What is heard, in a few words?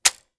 mech, 40mm; gmod; arccw uc commons attachments